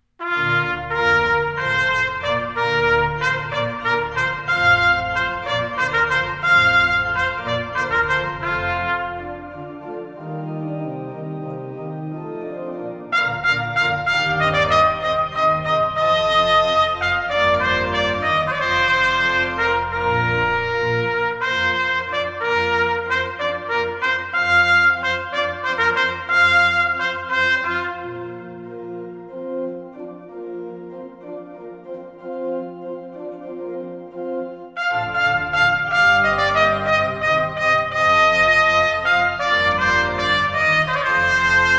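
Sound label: Hornpipe trumpet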